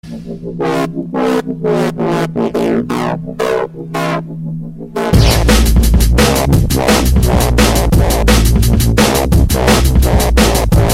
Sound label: Drum And Bass